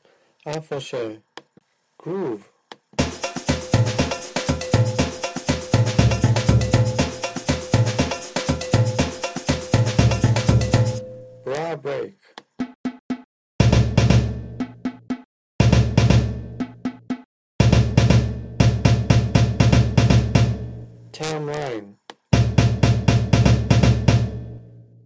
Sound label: Afoxe